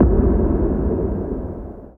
NOISE, Production Effects

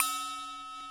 BOWL, METAL & WOOD; Roland - LA Composer vol. 1

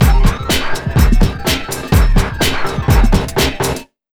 BEA, Big Beat Drumloops

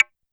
Side Stick Ana, YAMAHA RM1X

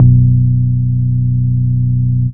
BASS SYNT; BASS SYNTHES; AKAI; Big Beat Samples & More

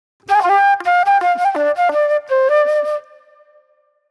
Classic Soul Flute

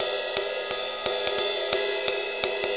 Ride Cymbal Pattern; Essential Percussion; Ride Cymbal Patterns

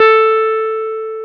GTR, Jazz Guitar; 90's Sample CDs; Club-50 - Foundations Roland; Jaz-Kessel

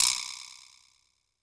Vibraslap, Standard Kit, Yamaha PSR-E323

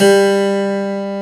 HARPSICH

